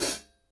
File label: hat